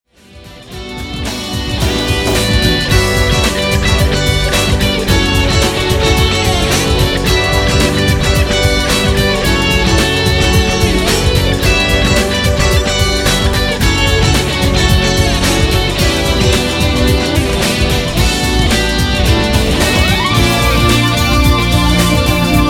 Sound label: pop